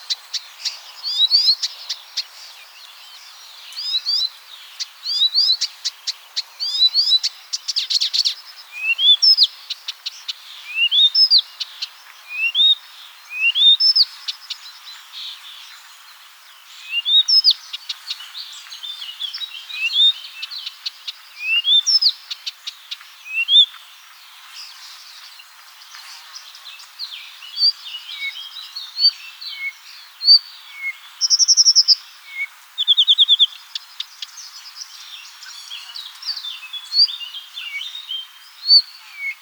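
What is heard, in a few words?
Blyth's reed warbler; sadovaya kamishevka; ornitologiya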